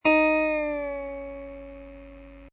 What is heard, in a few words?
the reverse bend